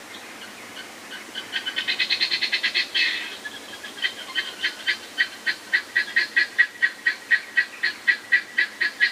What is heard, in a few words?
Birds Various